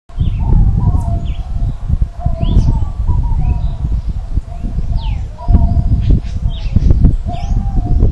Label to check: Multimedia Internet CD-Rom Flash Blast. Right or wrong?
Wrong